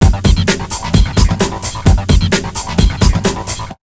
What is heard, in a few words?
HARD 130, Big Beat Drumloops; USB Soundscan vol.25; AKAI